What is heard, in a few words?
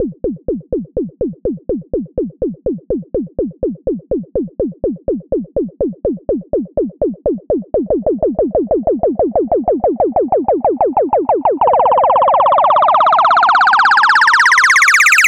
FX Uplifter Triplet